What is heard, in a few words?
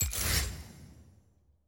button open; champ select; champgrid